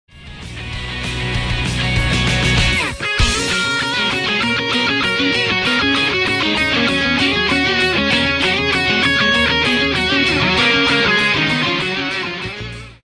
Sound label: karma instrumental